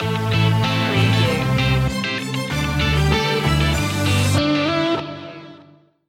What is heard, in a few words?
lavish lifestyle stinger